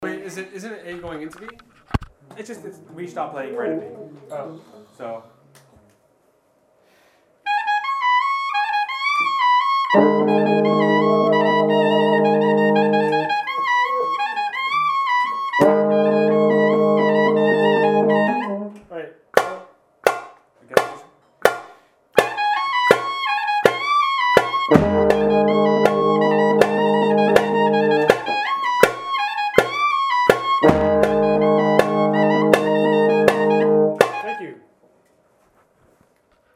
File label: rhythm hit, rehearsal